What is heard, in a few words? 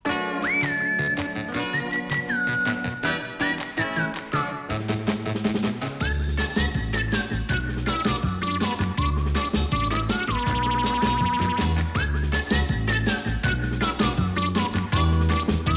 game startup